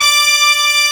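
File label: BRASS D5 left; BRASS#1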